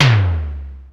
Tom, Roland Digital Drum Brain DDR-30; Drum Samples

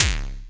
kicks, Bass Drum

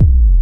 Hard Kick

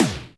snaredrum, Yamaha RM 50

